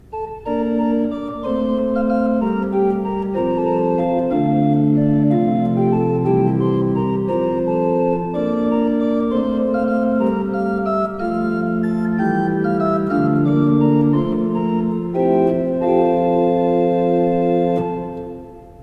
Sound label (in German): gebraucht orgel; Kemper